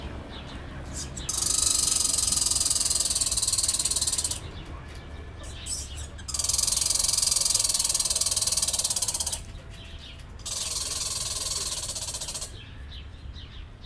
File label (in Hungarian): teljesen eltero hang, kanadai voros mokus; debreceni zoo 2019